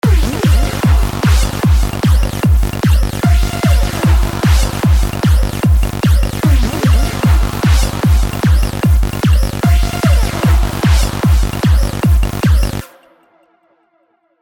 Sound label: dark psy trance